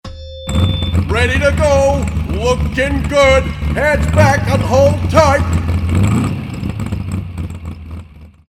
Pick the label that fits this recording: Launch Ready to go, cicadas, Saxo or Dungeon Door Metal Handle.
Launch Ready to go